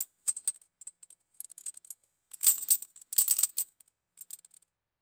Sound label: small metal grenade pin jingling; weapons